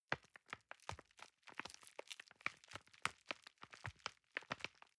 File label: envelope handle soft